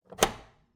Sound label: Cabin, outer doors, double doors, tension, latches, wooden, screens, slide bolt, wide; Eiravaein Works; Vaeyan IV